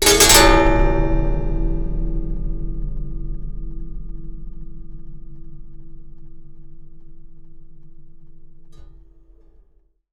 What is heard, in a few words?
Raw, Wire & Tin, contact mic